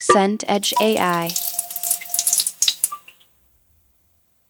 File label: tap drip slow